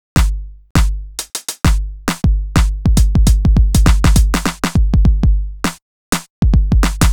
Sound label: Groove Pizza 101bpm